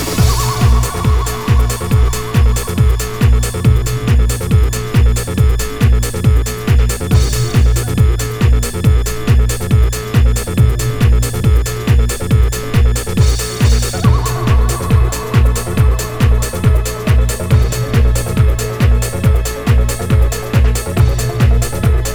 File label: Birds (Instrumental)